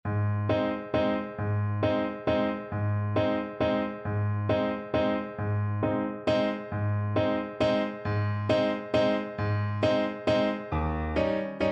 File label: horn, French Horn